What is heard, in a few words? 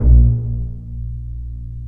Bass Piz Bb0; Digital Sound Factory Virtuoso